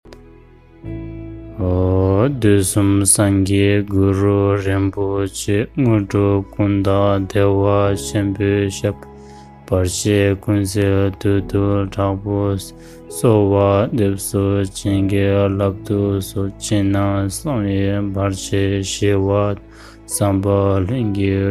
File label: Tibetan Vajra Chant